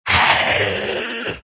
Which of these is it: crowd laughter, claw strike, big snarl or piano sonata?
big snarl